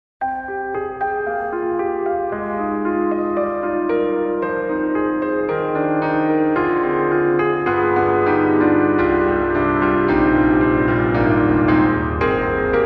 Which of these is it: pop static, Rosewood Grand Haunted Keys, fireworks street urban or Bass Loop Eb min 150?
Rosewood Grand Haunted Keys